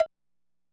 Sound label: Cowbell